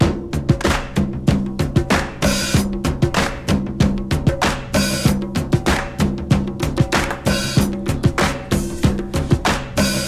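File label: 95 bpm drum beat d key, kick tuned to the D note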